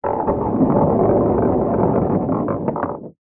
sport pool balls roll inside pool table release for new game